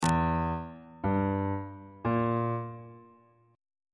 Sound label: b sharp mixolydian chord 3 is d double sharp diminished on bass clef